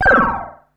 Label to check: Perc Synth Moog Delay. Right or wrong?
Right